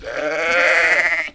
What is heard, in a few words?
Sheep Bleat